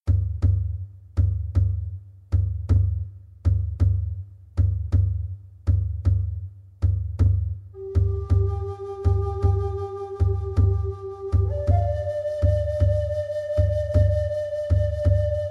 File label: Earth Beat